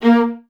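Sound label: violas A#3 staccato, String Ensembles; Miroslav Vitous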